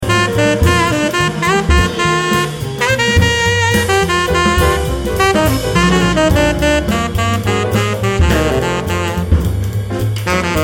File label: Blues